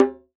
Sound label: Djembe, World Percussion; Cymatics; PHARAOH Beta Pack; Pharaoh Premium Drum Samples (BETA)